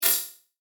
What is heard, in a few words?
Flash Fast Metallic